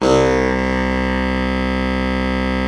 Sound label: BARITON, BARITON SAX; Best Service; Brass Super Section